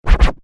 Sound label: Turntable Scratching; DJ (Sound Effects:Musical)